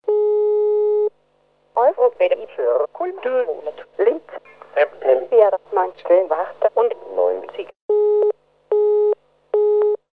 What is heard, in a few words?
jingle; linz